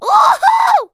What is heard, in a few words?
fly; Ginger